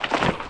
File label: step